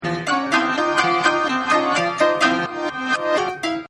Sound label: Psycho Piano; Pianos; Musical; Partners In Rhyme